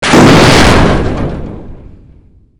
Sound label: crash, damage; DCS